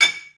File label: glass